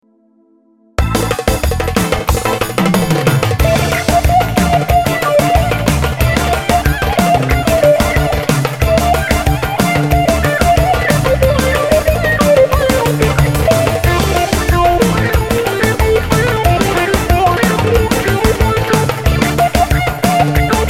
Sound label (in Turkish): wah wah gitar